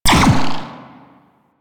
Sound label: Drone Fire; Super Drone Attack Osprey